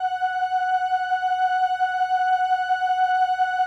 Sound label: LAMBDA, String Machines